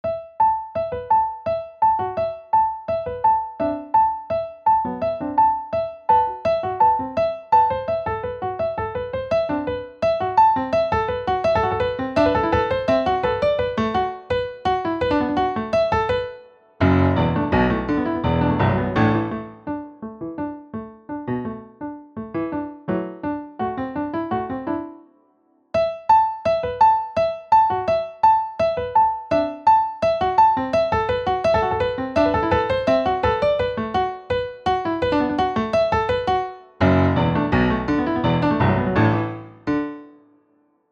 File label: piano; 3 Movts Piano Movt 1